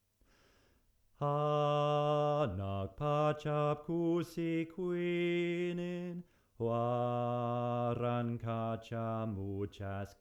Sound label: bass, choir